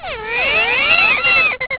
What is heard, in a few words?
beep slide